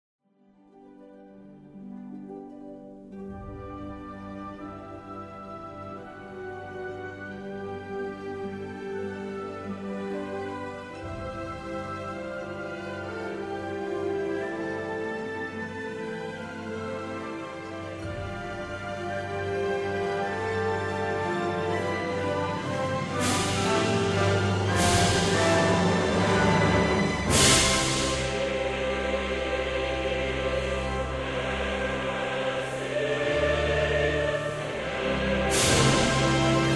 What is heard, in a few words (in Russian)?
Музыкальная медитация для очищения чакры основания